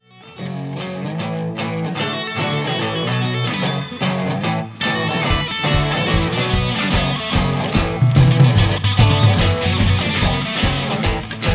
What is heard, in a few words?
Riff